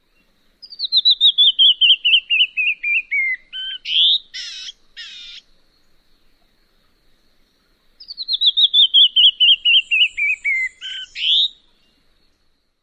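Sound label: Canyon Wren song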